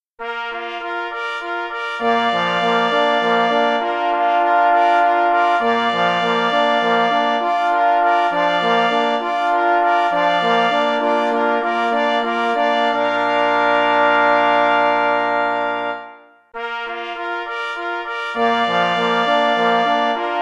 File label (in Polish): Hejnal sklad mieszany